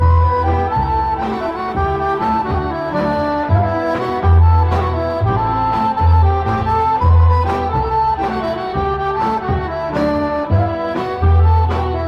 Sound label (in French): Bulgarie; Tzveta Moma